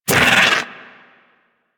bug vocal pain; Warframe; Hunting Critters; Inf K Drive Bug

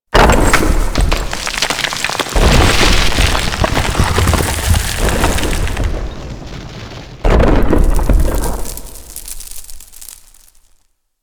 Cave Sounds Random E; Warframe; Ambience; Grineer; Gnr Cavern Corridor 1

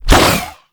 Star Whoosh, Infested Throwing Stars; Warframe; Weapons; Coda Pox

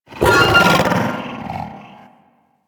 Pain Growl C; Enemies; Vocals; Warframe; The New War; Archon; Amar

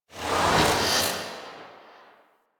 Whoosh; Warframe; Yareli Quest

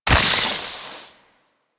Secondary Barrel Beam Attach, Weapons; Warframe; SU Modular Guns

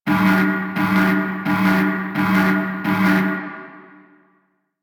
Boss Warning; Warframe; Caliber Chicks minigame; transitions

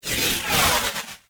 Osprey Dodge; Warframe; Corpus

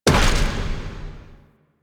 Fly Inner Depths Detach Land C; Sentient Devourer; Sentient Spawn Outdoors

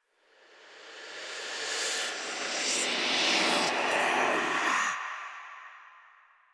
Cave Whispers

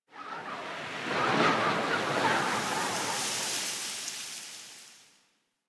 Wind Gust Small; Ambience; One Offs; Warframe; Eidolon Plains

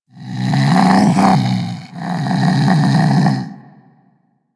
Vocal Snore E; Warframe; Hunting Critters; Vulpaphyla

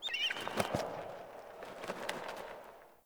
Bird Mountain; Ambience; One Offs; Glades Of Andurin

